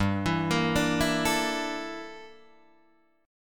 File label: G Minor 6th Add 9th G down Guitar Open D